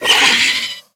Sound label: scared, headcrab black; creatures; vox